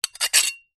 glass shards x2 scrape together; foley

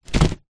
foley present wrapped box set down; zapsplat

